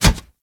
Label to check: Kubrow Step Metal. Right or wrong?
Right